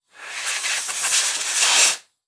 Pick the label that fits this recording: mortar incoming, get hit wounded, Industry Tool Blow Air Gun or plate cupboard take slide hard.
Industry Tool Blow Air Gun